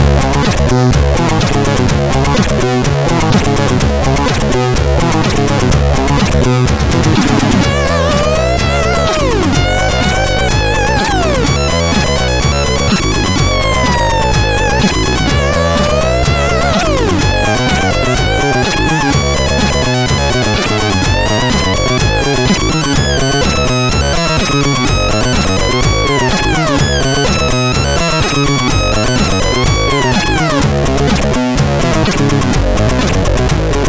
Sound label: Hard n Heavy Intro, sid CSG8580R5; Wedge